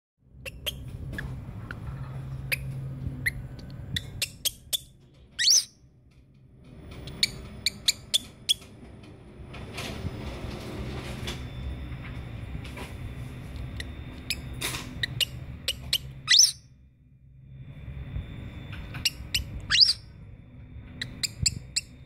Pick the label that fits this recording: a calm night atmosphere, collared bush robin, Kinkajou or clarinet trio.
Kinkajou